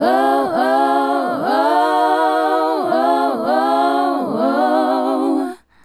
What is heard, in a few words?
Female Group, Hip-Hop and RnB Vocals